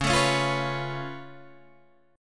C sharp Augmented 7th C sharp down Guitar Standard, chord; C#+7; strummed; JGuitar